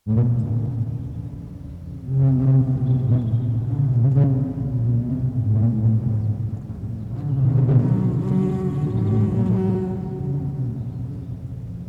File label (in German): bienen